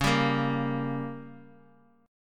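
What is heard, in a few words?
G Diminished, D flat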